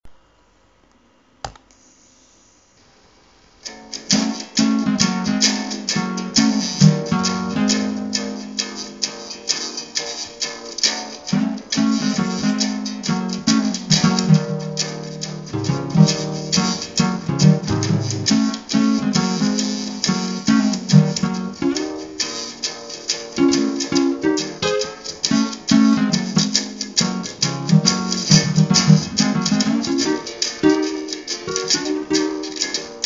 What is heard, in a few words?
Guitar